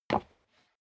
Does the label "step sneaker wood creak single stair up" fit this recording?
Yes